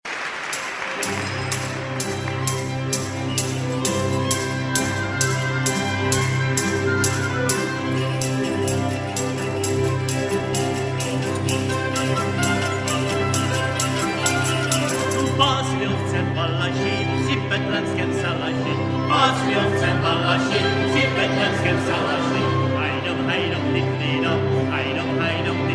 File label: hled male jezulatko; Pásli ovce valaši